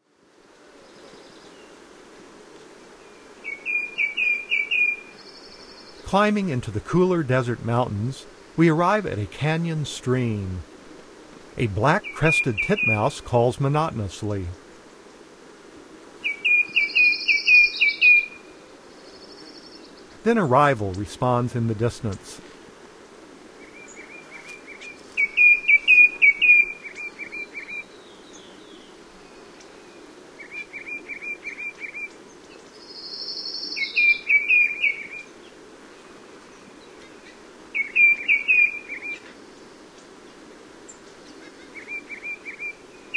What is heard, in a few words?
Black-crested Titmouse, Bird Sounds; North America; Common Western Species; Know Your Bird Sounds